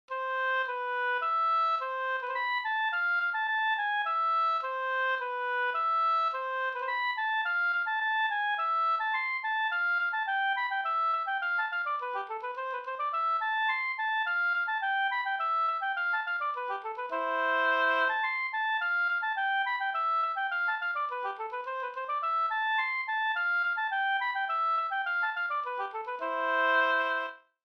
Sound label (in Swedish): ganglat; Marsch e Junkas Jonas